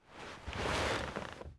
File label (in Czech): posazeni postel; Mafie 1